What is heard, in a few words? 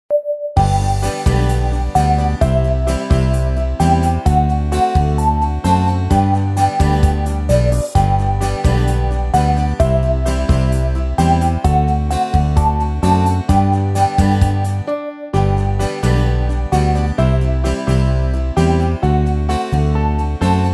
Karaoke